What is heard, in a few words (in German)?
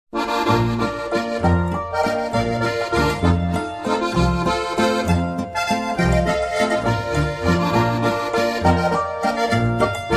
landler